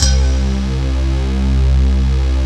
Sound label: DYNATES, pads; Pro-Rec - Synthsonic